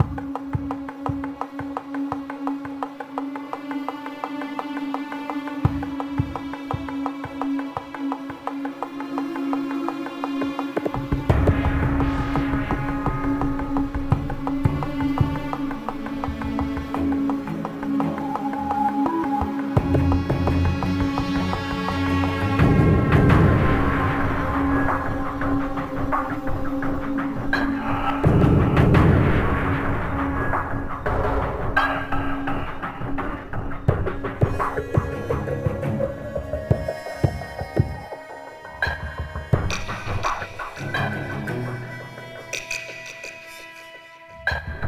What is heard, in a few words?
Chaos Factory Ambient; modern combat 3 fallen nation; android